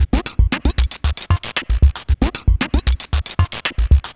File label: Crunchy, Beats